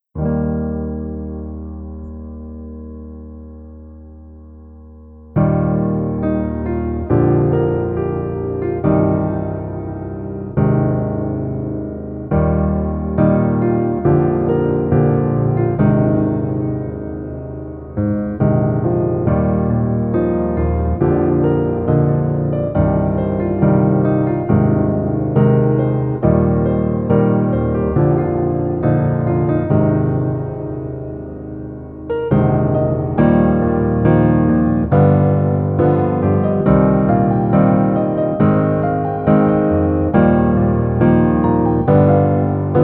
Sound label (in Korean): Piano ver, 멜로디MR, 남자키